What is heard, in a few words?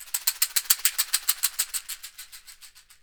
CAXIXI; ILIO; Synclavier Percussion 2; World & Orchestral